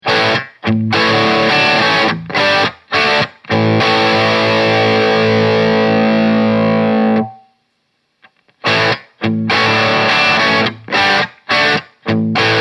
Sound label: Crunch Tube Stages